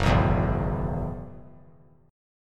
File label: F sharp 7th Suspended 2nd Suspended 4th; F#7sus2sus4 strummed